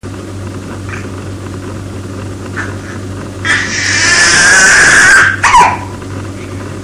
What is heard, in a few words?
squeek, baby; 7 mnths